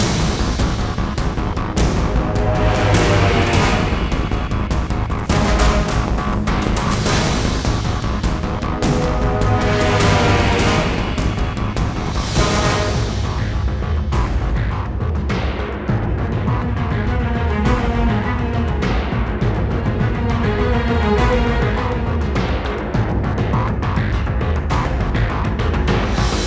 Fall Setup Loop; Operation Black Flag; Heist

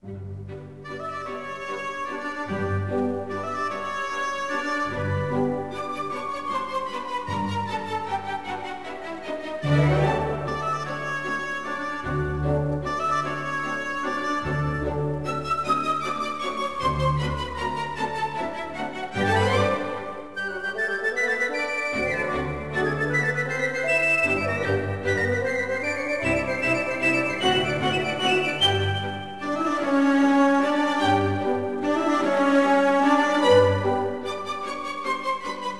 Overture; Thieving Magpie Overture; toonstruck

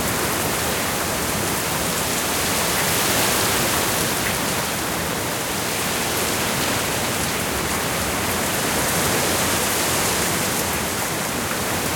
ENVIRONMENTAL RAIN LOOP; Dungeon Defenders Eternity

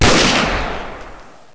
fire mark II

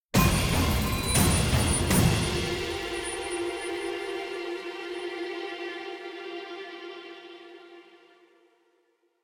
String; Assassin's Creed Unity Dead Kings

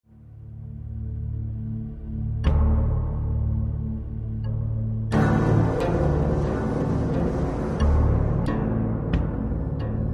Linear Tense

